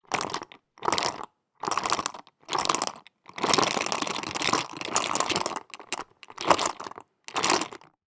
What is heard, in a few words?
nail varnish glass pots many in box shakes; household